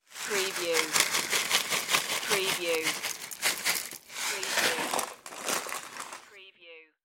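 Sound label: Shaken Cereal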